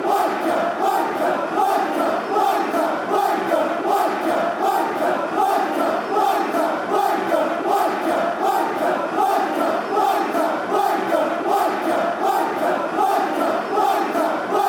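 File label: chant, football fan chants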